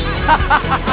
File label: sample, male laugh